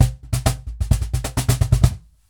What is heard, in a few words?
Cajon Fill; musicradar